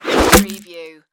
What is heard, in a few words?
Arrow Impact Wood